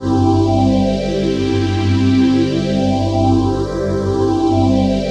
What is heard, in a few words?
PHASE PAD, ATMO PADS; Best Service; XX Large